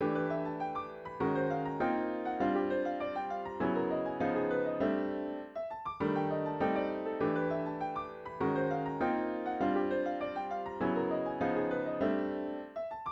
iii-vi-ii-v fourths harmony c

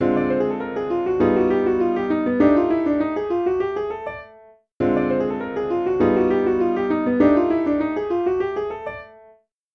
approaches ii-v7

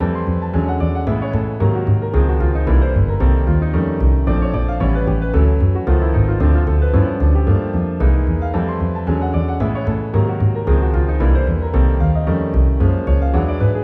retro steps piano bass c

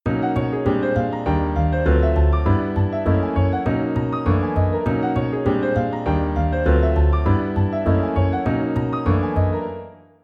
retro steps piano bass

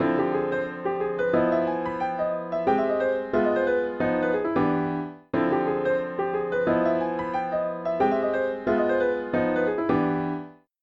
aug. scale ii-v7 ii-v7

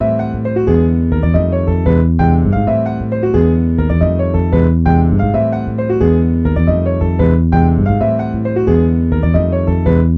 ii harms minor

